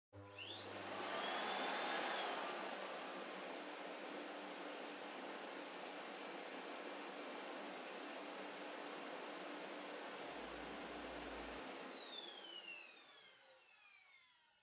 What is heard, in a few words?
Vacuum Cleaner Far Vacuum Low Long; 掃除機03-60(遠い バキューム 低 長)